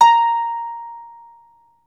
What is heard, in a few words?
CELTIC HA, Celtic Harp